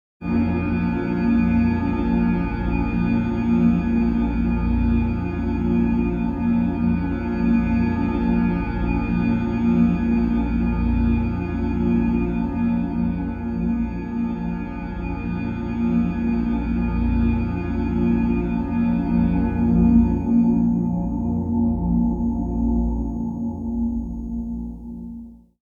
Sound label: Dark Mystery Glass Drone; Fired Earth Music